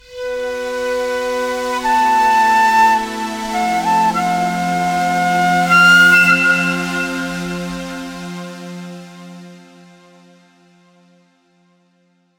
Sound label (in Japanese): 和ジングル